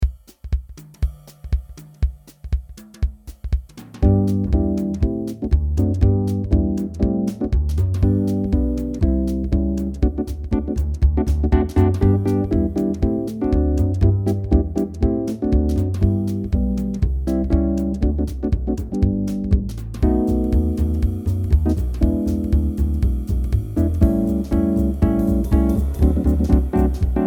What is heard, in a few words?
Rhythm Changes G 240bpm